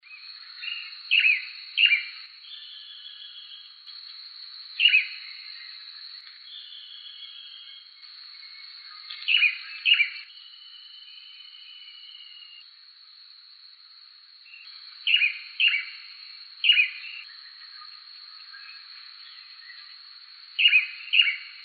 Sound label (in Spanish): BAILARIN AZUL, Tangará (Chiroxiphia caudata)